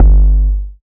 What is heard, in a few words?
808 toney G; Hip-Hop; Tonal; Slate; CAICOS Hip-Hop Sample Pack